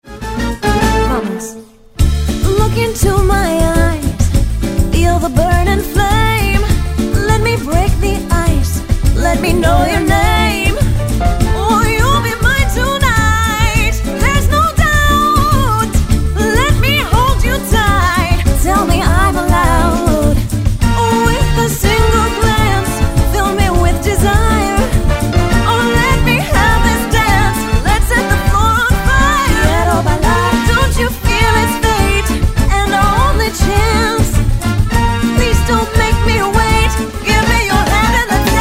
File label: samba; vocal samples